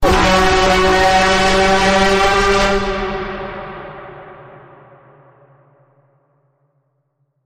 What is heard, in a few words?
Full Bend, F sharp